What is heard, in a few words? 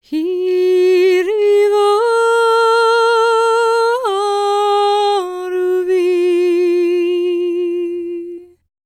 MOURN, CELTIC, World Voices; ILIO; Vocal Planet VOL-5